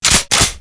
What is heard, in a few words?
reload; Messiah; WEAPON